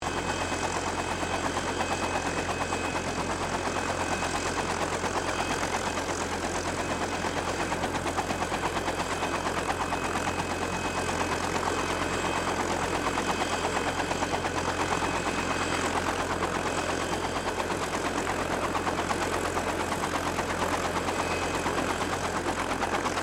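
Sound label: helicopter Bell UH1 Iroquois idle; RAN UH-1 Iroguios; Helicopters (Sound Effects:Vehicles)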